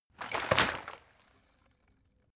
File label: bottle water big shake single; berufe